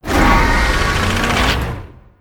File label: Fel Cannon Play